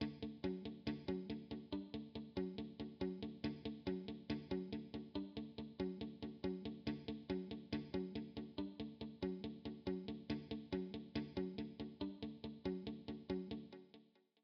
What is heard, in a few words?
KONTAKT LIBRARY INTRO GUITAR PART OFF